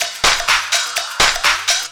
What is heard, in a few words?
drm 125, drumloops; PS-28 Vocal House Samples; inthemix